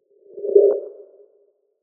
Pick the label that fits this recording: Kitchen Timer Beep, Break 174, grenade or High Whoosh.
High Whoosh